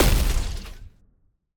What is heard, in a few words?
explosion Afflict; explosions; Mindustry